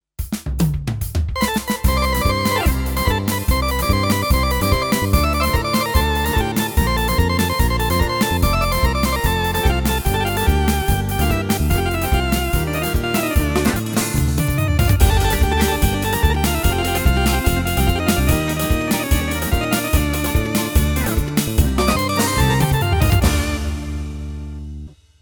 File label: farfizza; triton extreme